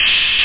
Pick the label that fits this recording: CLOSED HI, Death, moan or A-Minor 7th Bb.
CLOSED HI